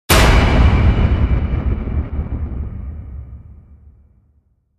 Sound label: Gank Hit Metal; Ninja Tracks